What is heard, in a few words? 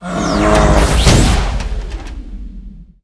mech bear attack